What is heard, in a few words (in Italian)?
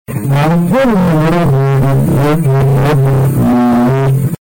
ah solo; Ah, solo a Maronna cò vie entra sempre fra noi.